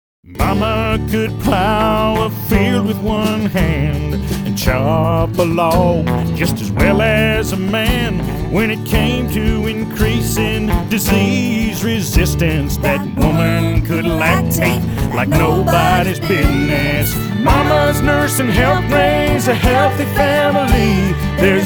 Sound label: Country; breastfeeding; national breastfeeding campaign; adcouncil